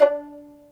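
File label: PIZZ VLN A2, violin; AKAI S-Series CD-ROM Sound Library VOL-2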